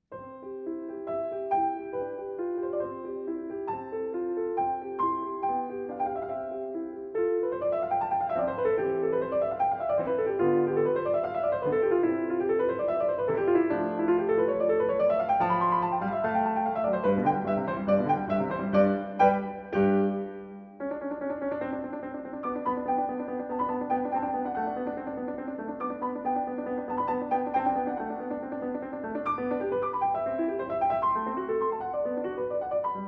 Piano Sonata No. 16 in C Major, K. 545 Sonata Semplice I. Allegro